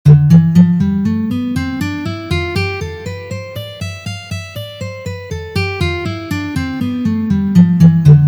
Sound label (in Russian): Gamma Do mazhor pozitsiya